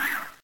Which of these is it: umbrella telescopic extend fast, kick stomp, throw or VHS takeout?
throw